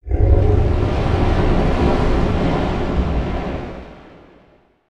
Cave Ground Rumbles; ninjago

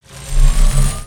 Charge; Charge LVL1

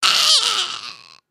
Die; Lego; Strombie; creatures organic

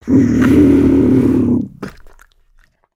Skullkin Idle VO; creatures organic

